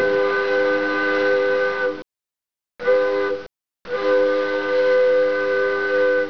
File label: horn